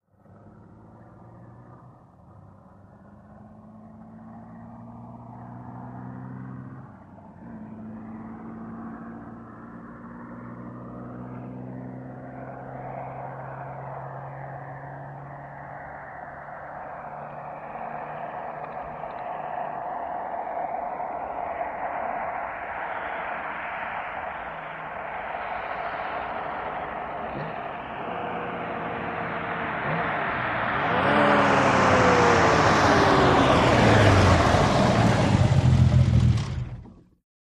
Aston Martin Switch; ext.

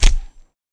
Corn Snap Low End Thwa; gore stabs & eat

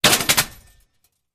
Bullet Impacts Metal

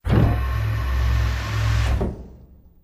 Elevator Door, elevators; Elevator Door Main

